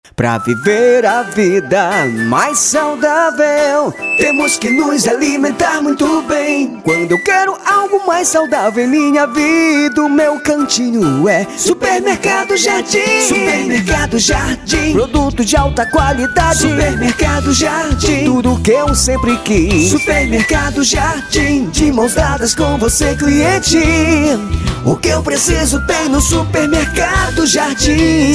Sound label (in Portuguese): jingle supermercado; supermercado jardim